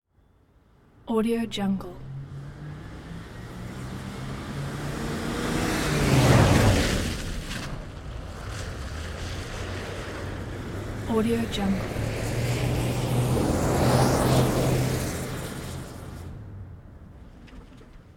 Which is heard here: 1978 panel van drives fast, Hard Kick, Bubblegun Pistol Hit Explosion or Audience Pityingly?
1978 panel van drives fast